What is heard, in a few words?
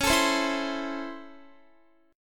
C sharp Minor Major 7th Sharp 5th C sharp Guitar; C#mM7#5; strummed; x,16,19,17,16,19